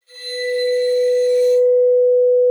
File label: ARCO H4, VIBES; Xsample VOL-4